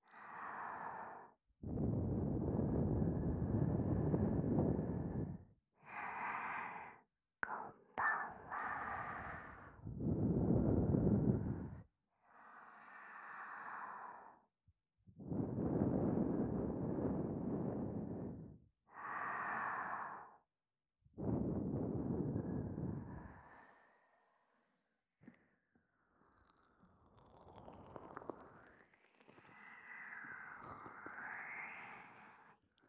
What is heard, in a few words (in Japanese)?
むぎゅっと密着 耳舐め